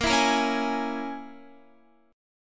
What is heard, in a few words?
A sharp Minor 7th Flat 5th, down, Guitar; 4E,4A,5D,5G,5C,6F; strummed